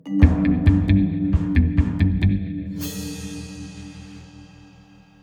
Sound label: Sting